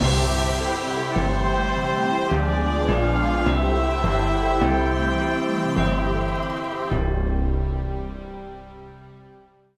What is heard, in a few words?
Win; Grim Adventures of Billy & Mandy; Wii